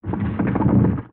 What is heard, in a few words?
Lava Rumbles